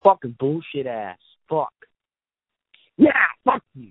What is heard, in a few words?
Scream